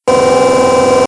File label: Base Claw Motor Loop; Playable Tutorial Level